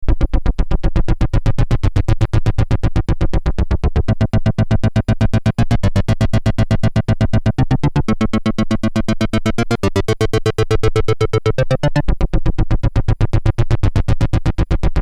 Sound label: synth